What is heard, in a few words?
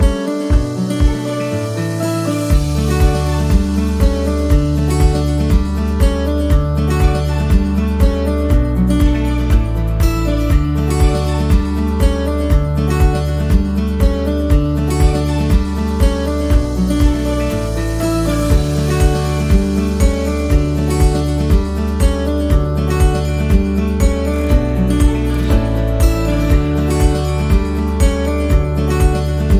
Feeling Happiness, ringtone; MIUI12